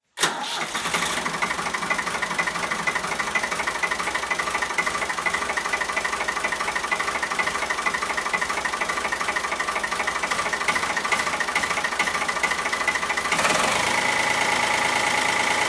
ford tractors; mono

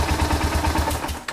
suzuki f50 mono mic shutoff, motorcycles; auto

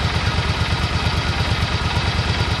suzuki c40 stereo mic idle, motorcycles; auto

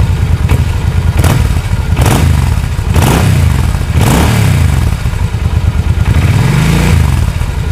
suzuki c40 mono mic rev, motorcycles; auto

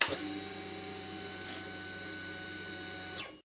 suzuki c40 stereo mic fuel injector, motorcycles; auto

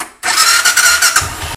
suzuki f50 start, motorcycles; mono mic

